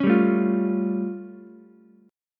A Augmented 7th A, Ukulele; strummed; JGuitar

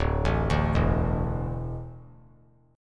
D Suspended 2nd D down; arpeggiated

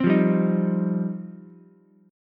F 7th Suspended 2nd F Ukulele; F7sus2; strummed; JGuitar

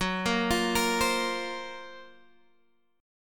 Gb Major 7th Flat 5th, Guitar, Open G; chordshape; down

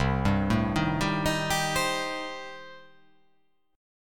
C Minor 6th C

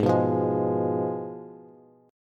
Ab Minor 6th, Ab, Bass; Abm6 strummed; JGuitar

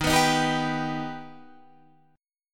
D sharp Augmented D sharp, chord

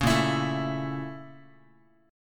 Bb Minor Major 7th Flat 5th, Guitar; BbmM7b5; strummed; 4,6,7,7,x,x; JGuitar

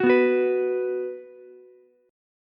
B 5th B Ukulele, chord; strummed; 11,11,14,14; JGuitar

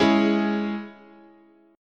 Ab Suspended 4th Sharp 5th, guitar chord

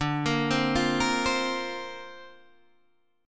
D-Minor 7th Sharp 5th D Guitar DADGAD, chord

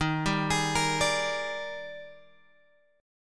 D sharp Major 11th D sharp, chord; D#M11 Chord {11 10 X 13 11 10} arpeggiated

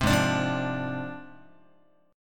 Ab 6th Flat 5th Ab, Guitar; Ab6b5 strummed; JGuitar

